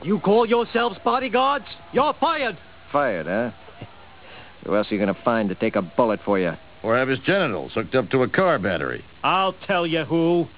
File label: woohoo